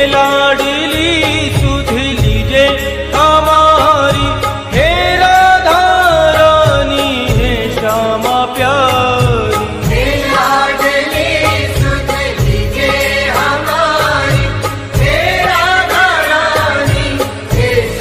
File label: Ringtone